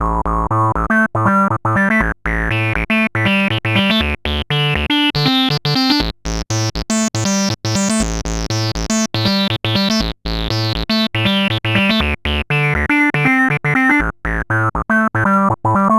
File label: acid loop